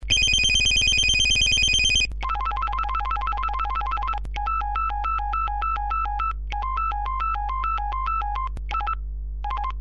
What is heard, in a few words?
phone; voxtel; active1000